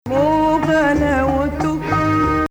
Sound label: 2nd Rast, maqam